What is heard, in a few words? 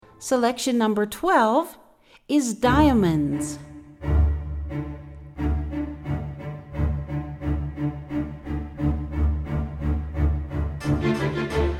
classical